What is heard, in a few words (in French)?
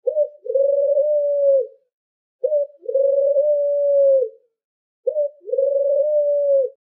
tourterelle roucoulement